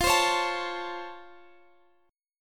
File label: Db Augmented Major 7th, F